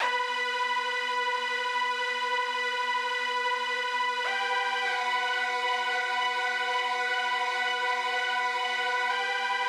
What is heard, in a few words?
HipHop Synth Bb min; Digidesign; Pro Tools Essential Loops